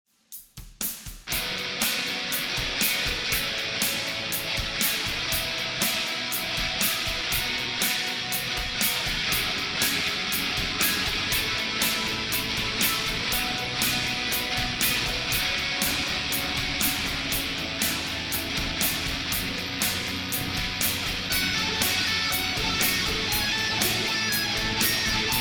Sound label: ROCK RIFF Residual Noise